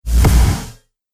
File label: Cinematic Hit, Trailer Sound Effects